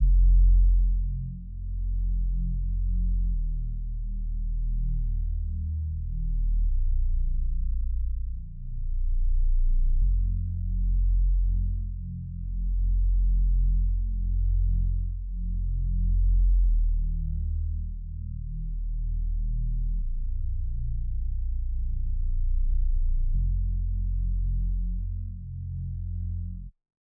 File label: 144 BPM F Min Bass, Hip Hop; Melody Loops; Cymatics; Gracias